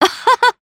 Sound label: Attack